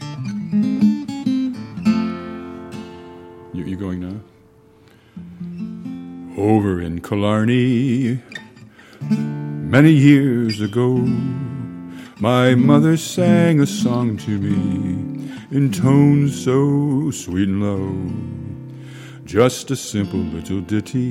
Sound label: Irish Lullaby